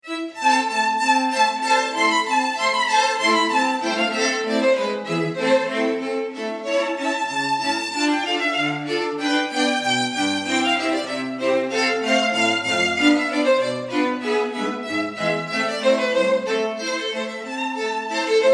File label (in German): Quartett Barock a-moll; rossini quartett; vivaldi